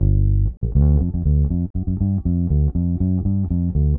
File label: Bass, 70s Analog Funk; Sonic Foundry (Sony Creative Software); What it Is! 70s Analog Funk